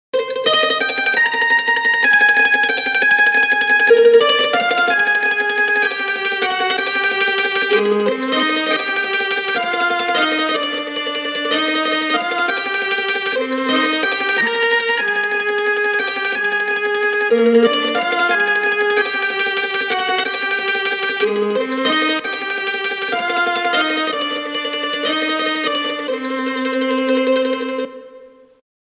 Mellotron Micro Mandolin Viola

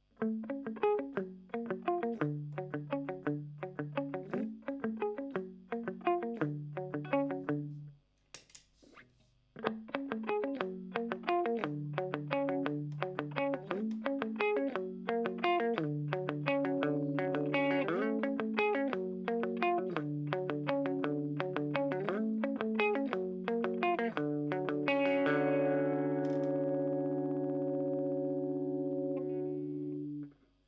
Clean Picking; Aria

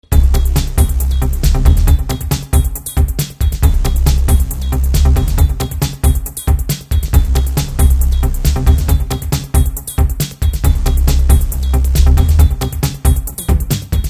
electro

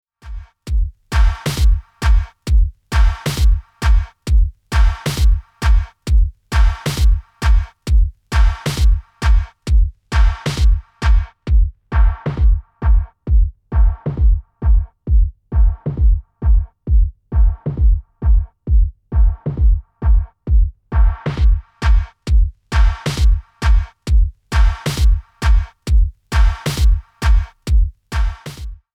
Channel LPF

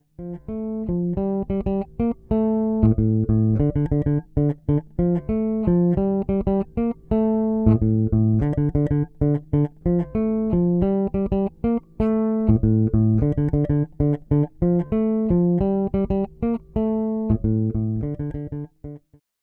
Sadowsky NYC Modern Bridge Pickup

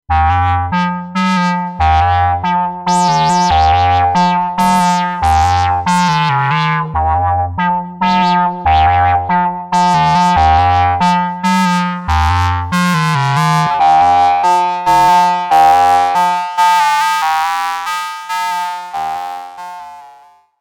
Lancet triangle shift

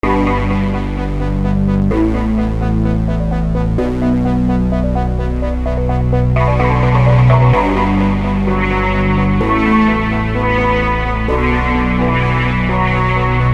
Melodic Tech Voices; Vengeance Sound Avenger 2; AR Missionary

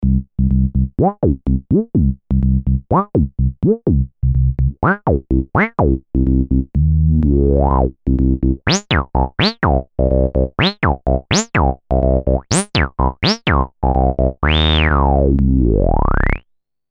Acid Filter

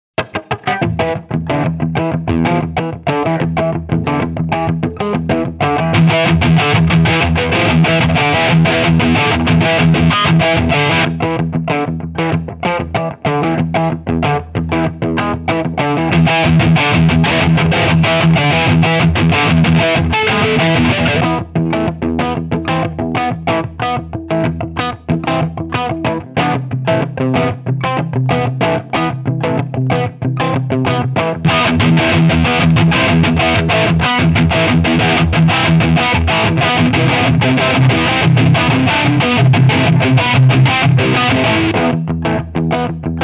Engl Reaper on off

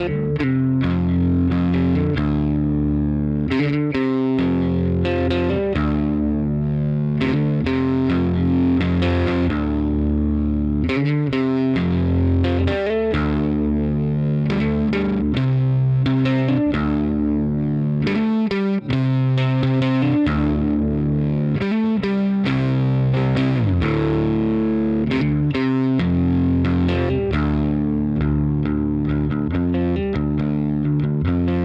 Source Audio Ultrawave SA 250

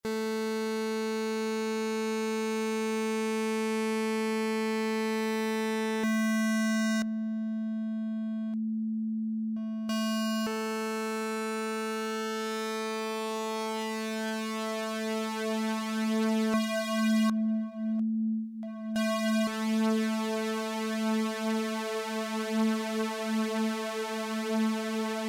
MicroFreak SuperWave

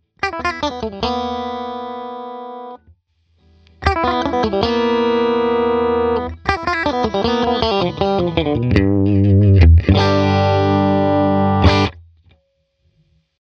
Dyna Single Notes; Universal Audio MAX

